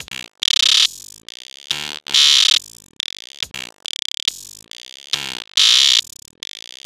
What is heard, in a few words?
Loop - 140 bpm, Stutter Sounds; 99Sounds; Mangling Audio